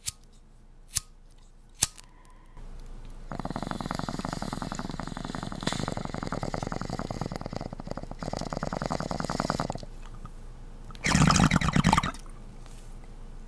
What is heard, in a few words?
bong; Zomboid; jiggas Green Fire